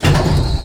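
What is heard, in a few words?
elev start, elevator start; Kerbal Space Program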